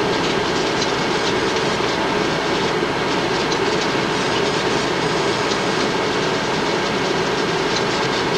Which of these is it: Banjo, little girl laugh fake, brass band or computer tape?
computer tape